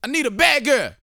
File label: VOCAL, R&B Dance, HIP-HOP